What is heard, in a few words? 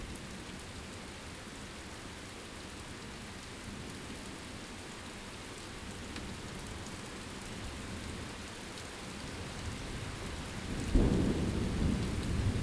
Looping Thunderstorm